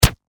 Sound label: Impact Punch Face, Impact, Punch, Face, Meaty, Flesh; Punches and Hits (Sound Effects:Combat)